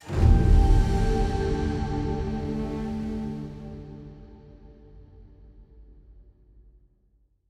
Stingers sting challenge

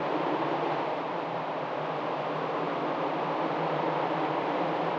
blizzard loop inside cave

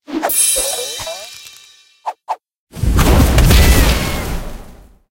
Partybooster; candy crush saga gamerip

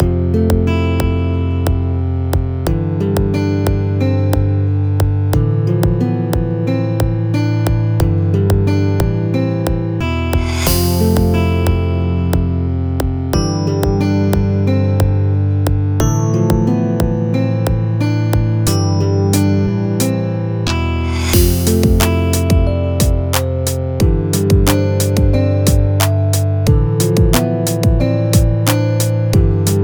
Start (Instrumental); Friday Night Funkin' Vs. Mofo

